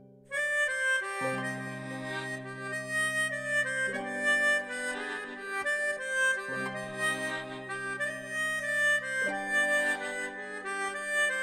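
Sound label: spokojnaya melodiya, calm melody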